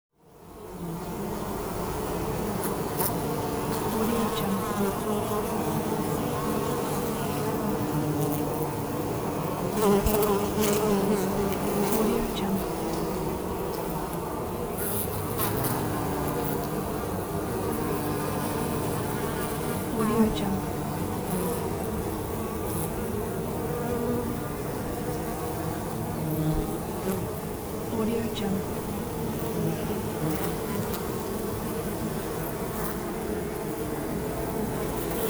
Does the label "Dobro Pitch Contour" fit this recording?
No